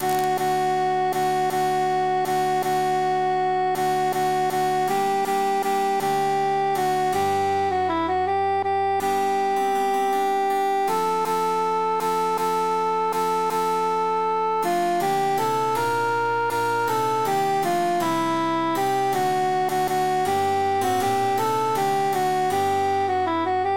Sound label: cantus emphasized; chi passa